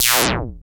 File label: FX Analog; Sound & Vision - Gigapack II